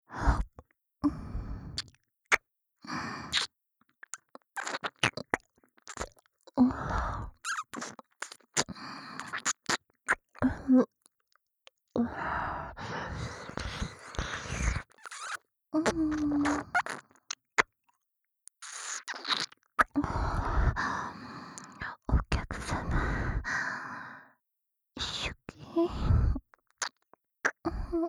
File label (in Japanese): おちんぽ淫語塗れの右耳キス舐めループ; KU100